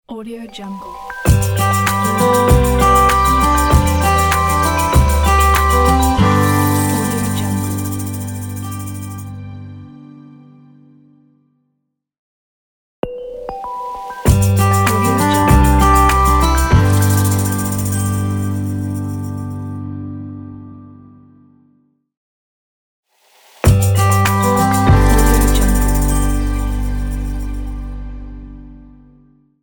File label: smart acoustic logo